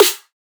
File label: snare dnb